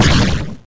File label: Shot; hydra castle labyrinth gamerip 2011